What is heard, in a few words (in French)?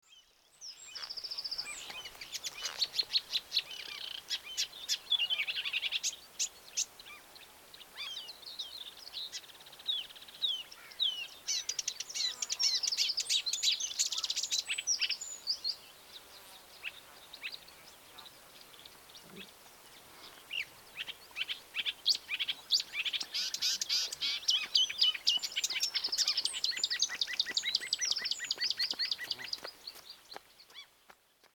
rousserolle verderolle, chant